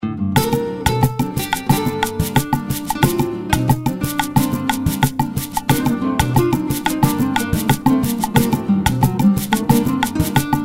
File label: Rumba Guaguanco